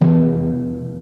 mid tom single hit e key; tom drum

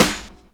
sparkle snare drum sound f sharp key

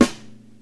tight snare drum sound g key; snare drum samples

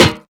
studio snare sound a sharp key

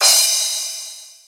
cymbal sound g key

